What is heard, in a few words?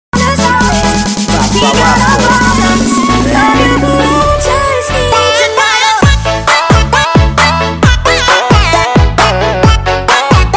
funky night remix